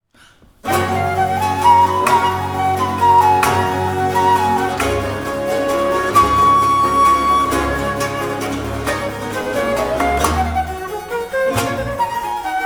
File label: Ensemble Sample